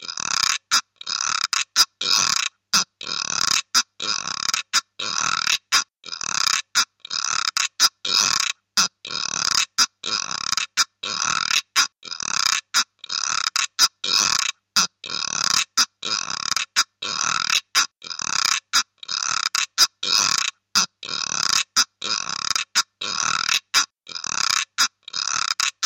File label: Batucada Güiro